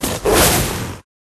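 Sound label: Rat attack up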